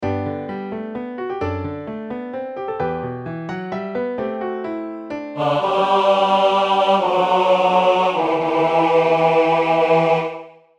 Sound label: Bass